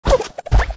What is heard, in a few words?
peace damage